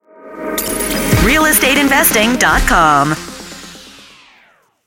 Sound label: jingle; reidotcom